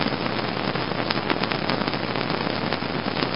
flare idle; svencoop; st mission

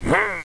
zombie pain; svencoop; biglolly coop

